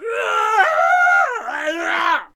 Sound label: Shield Bearer Attack Vocals